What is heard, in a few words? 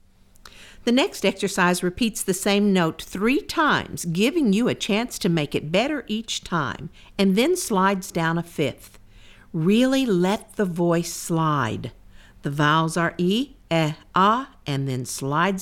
mid-range explanation - slow descending slide